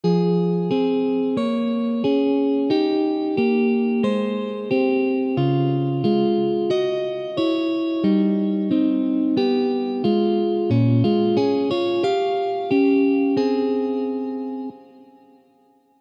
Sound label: small chord